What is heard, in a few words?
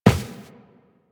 Run Default, Footsteps; Warframe; Tenno